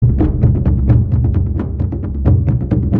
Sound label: drumbeat